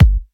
high quality focused steel kick drum g key

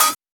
clear hi hat sample d sharp; hat samples

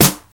00s Rap Snare D Key; snare drum samples

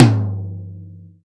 long tail reverb tom sound g key; tom drum samples

